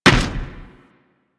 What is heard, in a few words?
Moa Step Default, footsteps; Enemies; Warframe